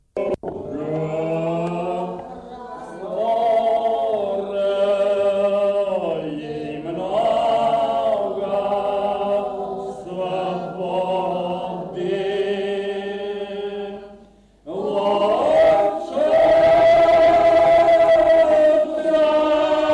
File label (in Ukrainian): фрагм пісні рос